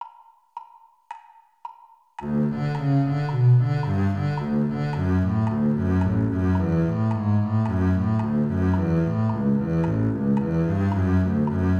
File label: bass; All-County